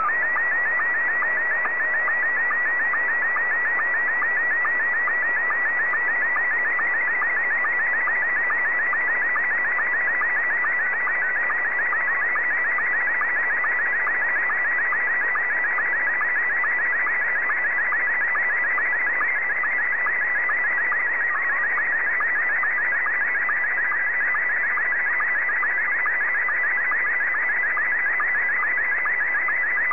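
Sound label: Scottie 1, slow-scan television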